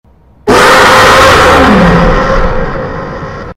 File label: scream; the rake; blood hour